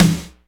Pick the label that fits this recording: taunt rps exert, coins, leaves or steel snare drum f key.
steel snare drum f key